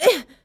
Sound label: female damage; Human